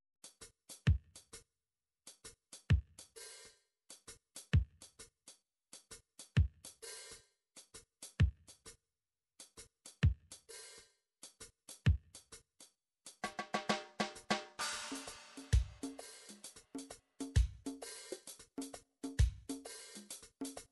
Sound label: drum midi raggae